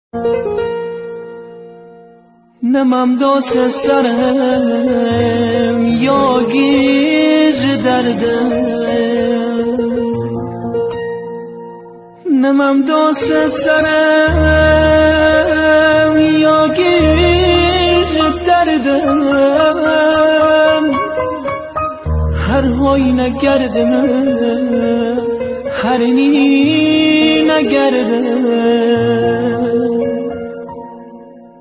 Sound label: lori ghamgin khoram abadi